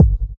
natural kickdrum g key, Royality free kick tuned to the G note. Loudest frequency: 132Hz